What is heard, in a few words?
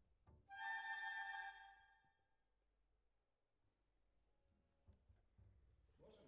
Cbasses